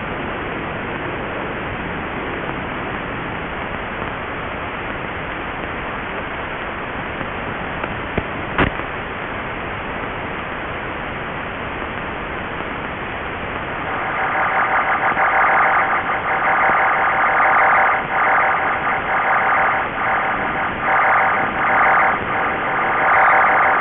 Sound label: MS burst